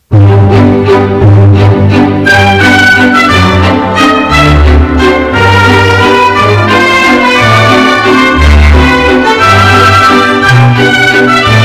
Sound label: ballet; fortnite emotes